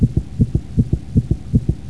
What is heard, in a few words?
heart extreme